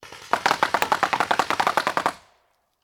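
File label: Paintball Gun Impact; Sound Ideas; Guns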